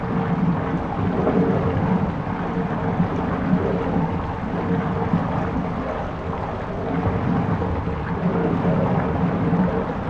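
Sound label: pump room flow; Star Wars Knights Of The Old Republic I; XBOX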